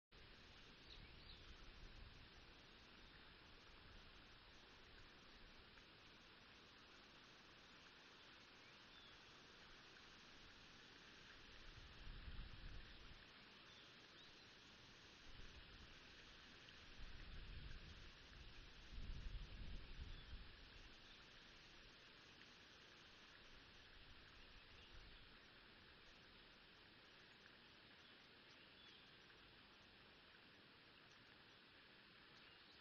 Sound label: riverside